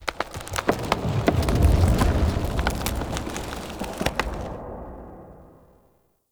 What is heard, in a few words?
Root Cave Root Movement D; Ambience; One Offs; Neathuns Root Cave